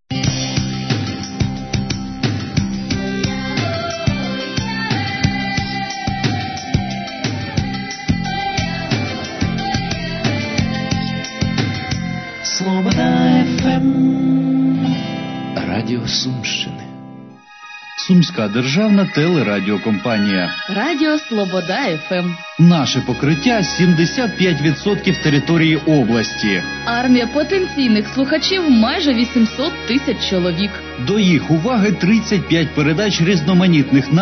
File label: interval signal; ukr; sumy; slobodafm